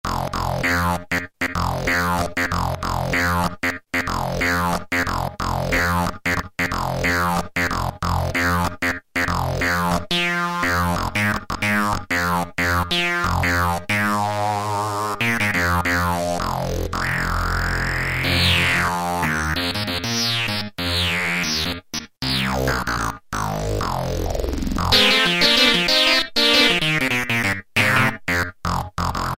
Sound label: The Buzz, Kurzweil K2000; Kurzweil K2000 V3